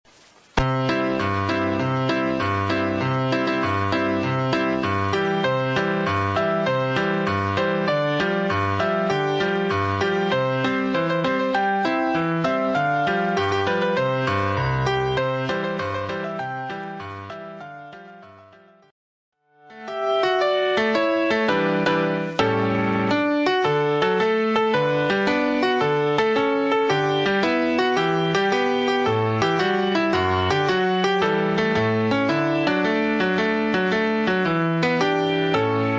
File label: cat dog song, piano for two